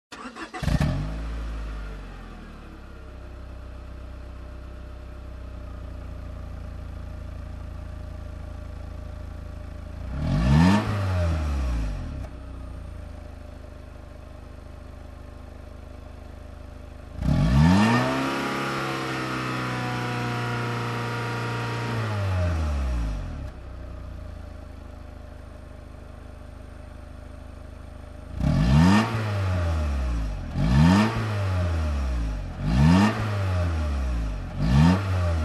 Car engine rev up

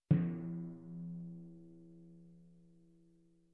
Single Timpani Hit Middle

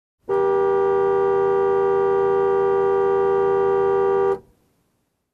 Car honking long from inside

